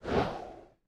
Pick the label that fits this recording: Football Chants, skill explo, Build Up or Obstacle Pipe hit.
Obstacle Pipe hit